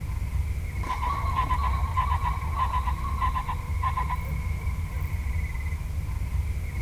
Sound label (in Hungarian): éjszaka, fácán; termeszetben